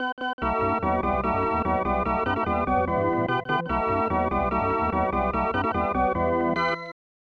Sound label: Fanfare ~ Drama; super bases loaded 3; snes